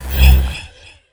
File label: ghost gun spawn; garrysmod